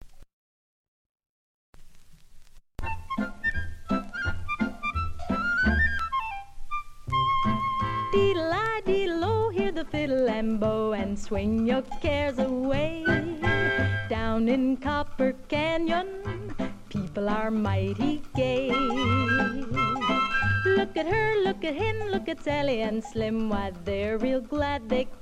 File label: Female vocal with Orch